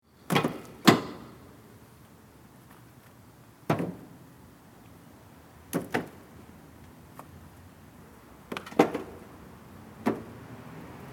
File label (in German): Steine aufladen langsam